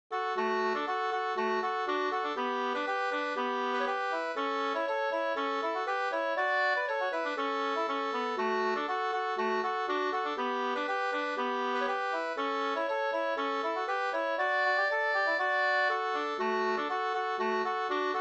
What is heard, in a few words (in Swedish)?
polka, 2st